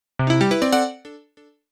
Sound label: Personality - Confident